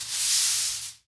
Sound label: SWEEP